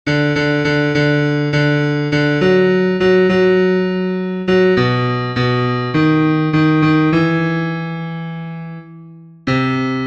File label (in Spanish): BAJO, himnos en piano; cantos del camino